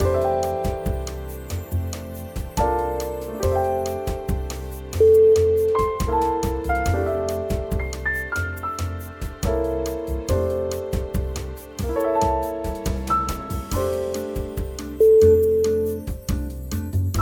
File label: light morning bossa